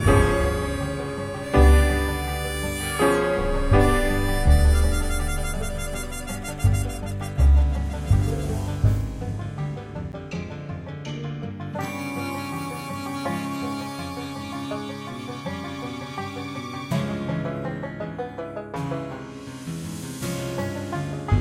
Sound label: Quirky